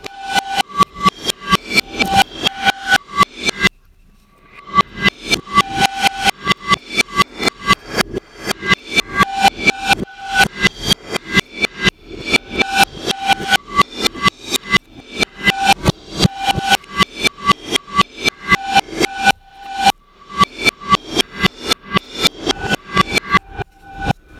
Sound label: drumming on bowls